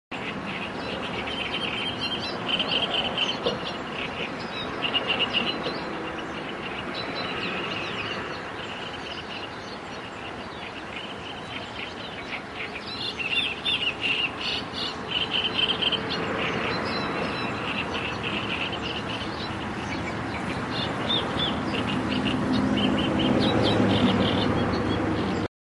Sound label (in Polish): trzciniak; zakole wawerskie